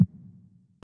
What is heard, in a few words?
snap, Yamaha Tenori-On Snapkit